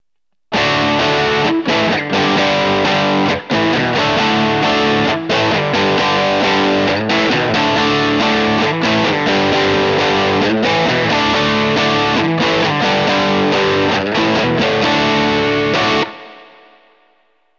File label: amp ac30 w ts9